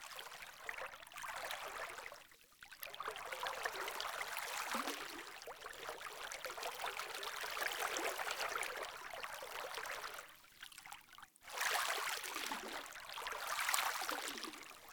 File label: Water Shallow Wading Movement Loop; Player; Entity Contact Movement